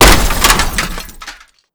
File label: jeep crash hard